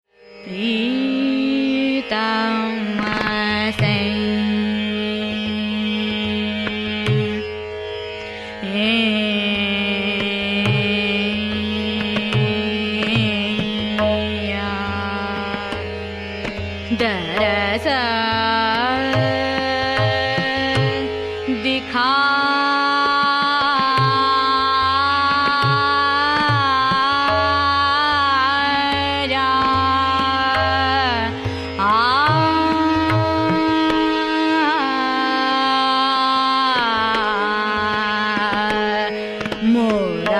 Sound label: Lalita Gauri